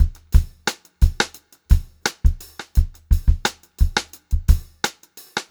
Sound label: Funk-Groove Drumloops, 87 STRVAR; USB Soundscan vol.38; AKAI